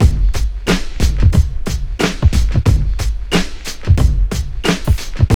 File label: TRIP 90, trip loop